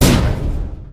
metal barrel impact hard; physics; Garry's Mod; hl2

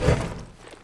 ladder, footsteps; Counter-Strike Global Offensive

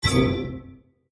restrict chat; League of Legends; lol air client

